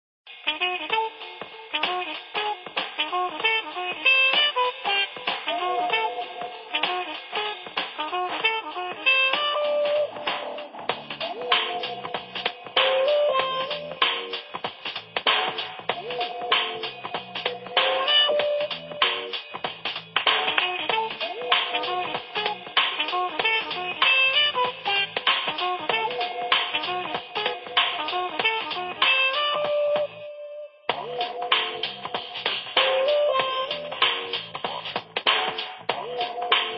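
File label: Music Ambient; Saints Row 2; PS3 Windows Xbox 360 gamerip 2008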